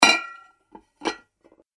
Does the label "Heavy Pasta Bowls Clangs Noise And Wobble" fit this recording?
Yes